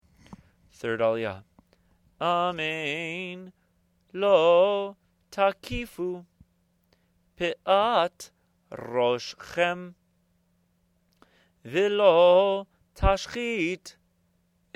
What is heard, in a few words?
Torah Chanted; Kedoshim